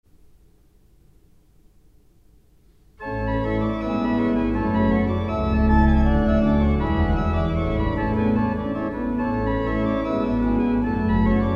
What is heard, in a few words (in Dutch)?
Holfluit en Prestant met Subbas en Koppel